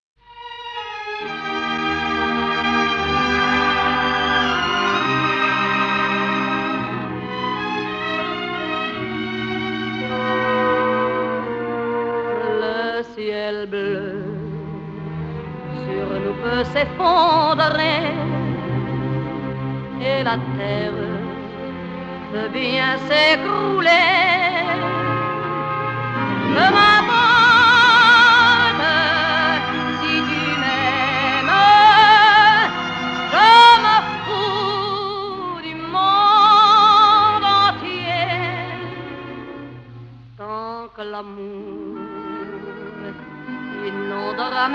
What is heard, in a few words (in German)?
Hymne; dod; dod jura